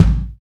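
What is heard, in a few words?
kick, Roland; Club-50 - Foundations Roland; Ext.Snare 1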